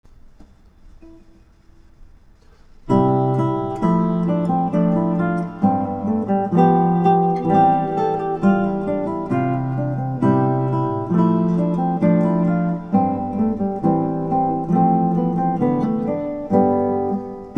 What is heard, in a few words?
gavotte